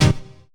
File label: SYNTH DX; Polyend; Legowelt